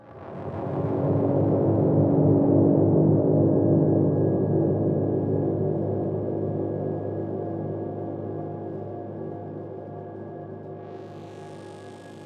Ambience; soma gamerip 2015

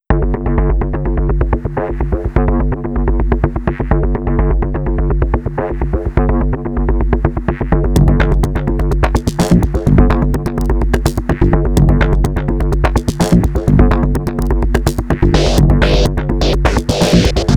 Dynamite Trap; Infra

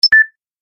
Alert

